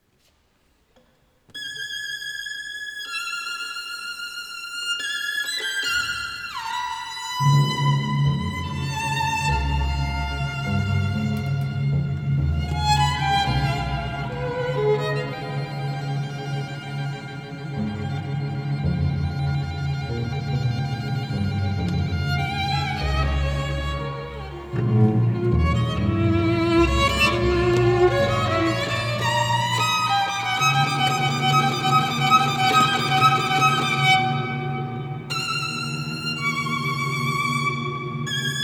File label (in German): Violine janacek mit Fade out; Janácek Violinkon